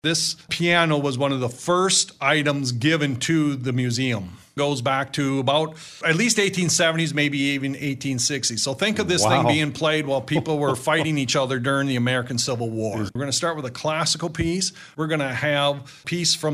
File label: steve piano two